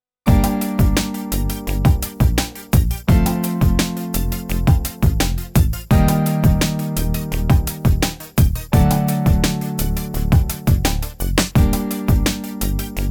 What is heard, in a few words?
Preamp Test Line RNP ISA TB12